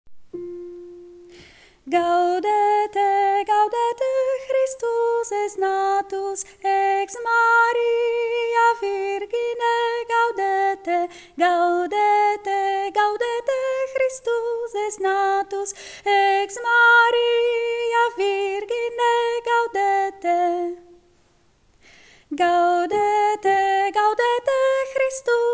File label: tenor; Gaudete